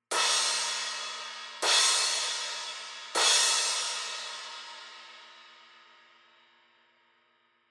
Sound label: 17 I Trash Crash hits, cymbal